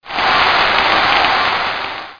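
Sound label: APPLAUSE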